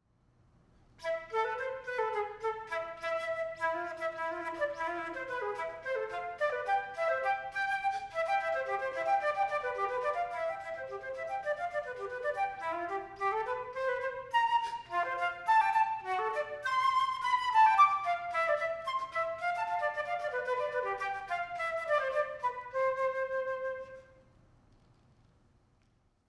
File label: bourree